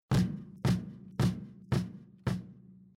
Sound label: base drum